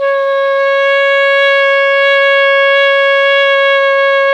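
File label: ALTO pianissimo C#4, Sax; Giga Samples Collection; ALTO SAX PP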